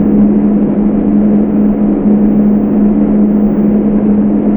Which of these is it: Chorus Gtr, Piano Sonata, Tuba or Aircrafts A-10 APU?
Aircrafts A-10 APU